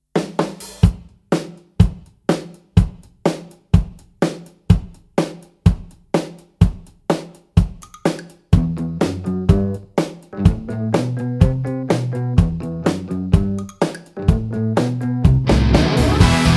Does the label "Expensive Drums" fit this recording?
Yes